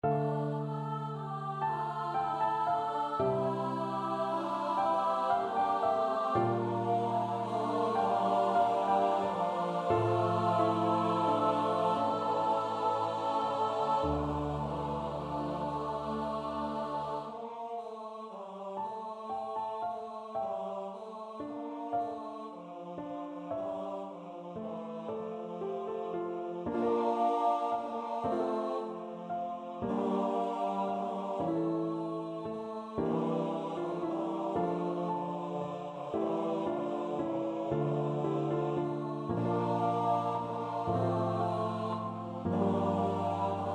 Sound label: Ring Out Wild Bells SATB